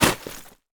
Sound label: ice bag drop